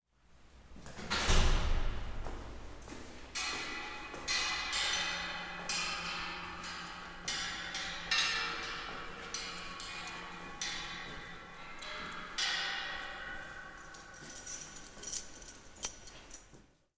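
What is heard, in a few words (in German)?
Geländer; zoom h6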